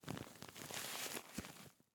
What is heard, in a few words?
Face Mask, Medical; Blastwave